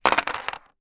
leisure game rummy o bricks down on plastic rack; zapsplat

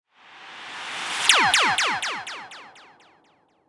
sound design sweep noisy laser; sound effects one

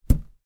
foley balloon inflated land on floor

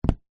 foley footstep single sneaker on plastic walkway

